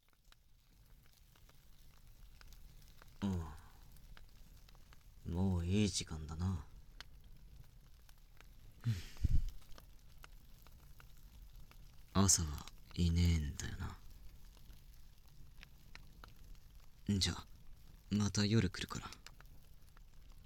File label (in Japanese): 耳吹き